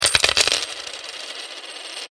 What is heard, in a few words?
money coins drop, Coins Drop Onto Table; Coins ( Approx 10 ) Drop Onto Wooden Table; foley; Drops; Objects; McKinney Sound